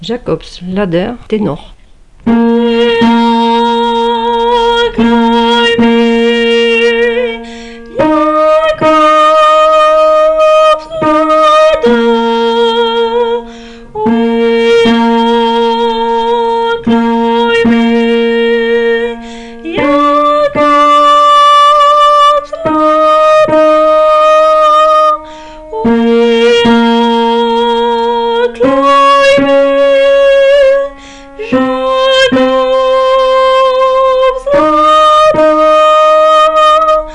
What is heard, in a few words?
Tenor; Jacobs Ladder